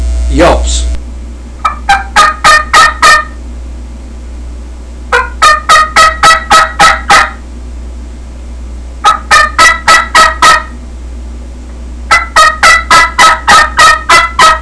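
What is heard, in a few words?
twin yelps, turkey call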